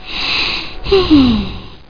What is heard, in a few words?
SIGH; Busy people of hamsterland